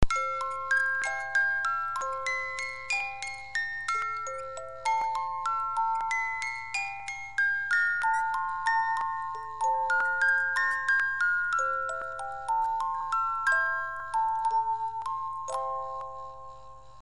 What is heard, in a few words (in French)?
memory 18 lames, boite musique